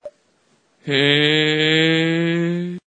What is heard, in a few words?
mmmm